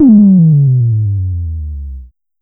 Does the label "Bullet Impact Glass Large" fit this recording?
No